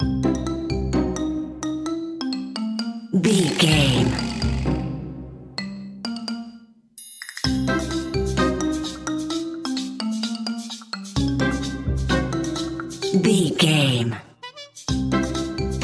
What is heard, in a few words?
wacky inventor